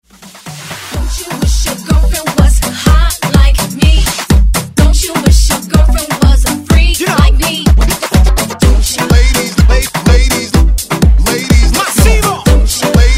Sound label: Afrobeat Hype Intro